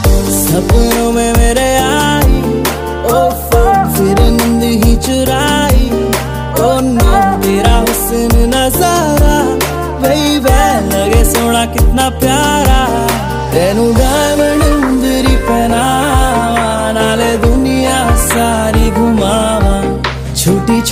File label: bollywood sweet ringtone